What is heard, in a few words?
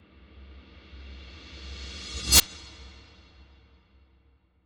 CINEMATIC RISER SHOCK